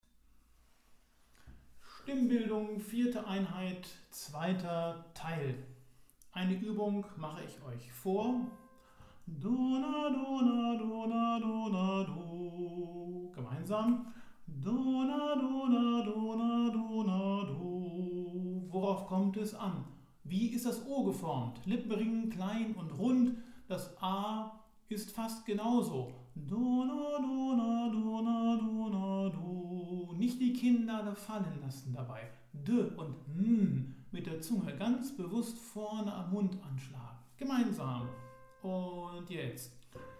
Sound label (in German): Stimmtraining